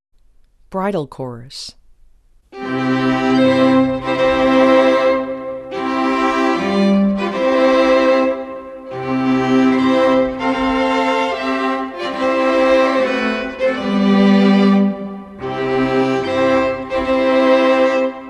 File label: bridal chorus, string quartet